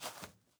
footsteps GRASS; PC Building Simulator

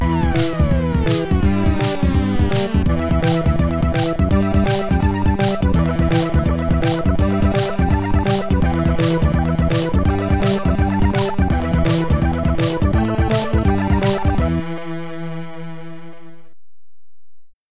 Level Complete; qix amiga

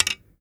Arrow Nock; Timelock VR

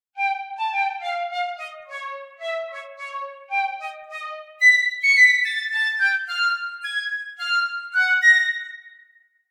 stems flute; gamerip; Hot Lava

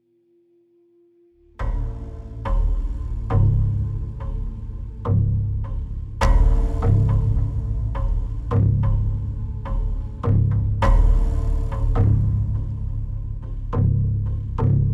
Tension Mood

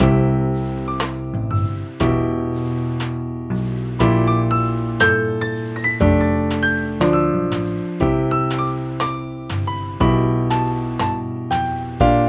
Jazz Romantik; singles 2 triple trouble windows gamerip 2005

